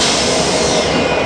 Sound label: stop end; 3DRMDemo